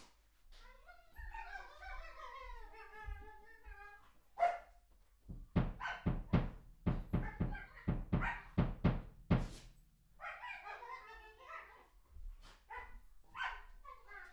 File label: Voc Fig8